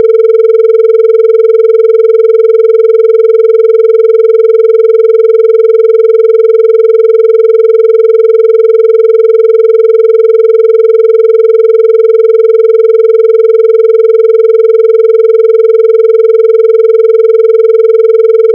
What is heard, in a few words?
Beta 20